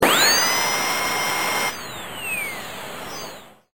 dentist drill

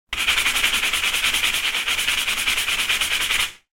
brushing

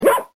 bark

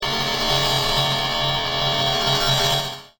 alien alarm

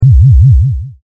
MECH HUM; Stereo

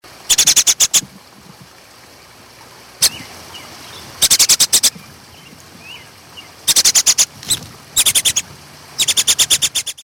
hungry baby

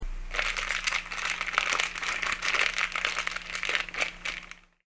scrumple

